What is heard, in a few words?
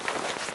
movement, weapons; gmod; csgo